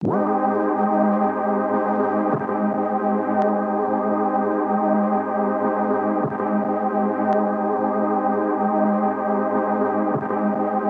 Tape Loop Pad G# Maj 7; Pads; Cymatics - Eternity Sample Pack

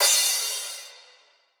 Cymbal (Wide), Analog Drum Kit; Cymatics - ANALOG EVOLUTION Beta

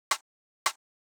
Drum Loop, 82 BPM Percussion; Cymatics; Vibes II Drum Loop Collection; Distance Drum Loop

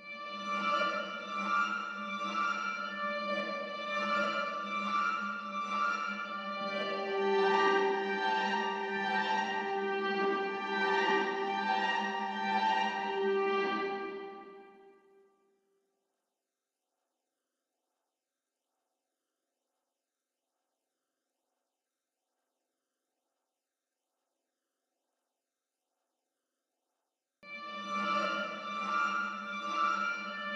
140 BPM D Min Pad; Melody; Loop Stems; Drill; Cymatics; 2022 Melody Collection; Smash

